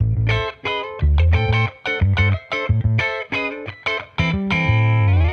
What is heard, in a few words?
P Bass Tele Mix 90, Guitar Bass Mix; 90bpm; funk soul